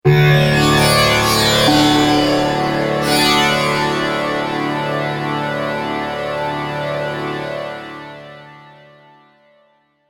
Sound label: Stinger, Sitar drone indent; Sitar drone indent introduction into/outro for any Asian project; Sound Design; Taj Mahal